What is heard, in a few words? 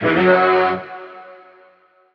vocal F#m; heya; SD AURORA Sample Pack; Slate